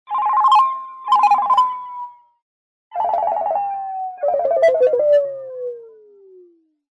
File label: funny incoming sms ringtone